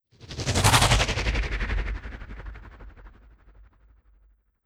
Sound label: Stone Cyclone; Legend of Keepers (gamerip) (2019); Necro Stone Cyclone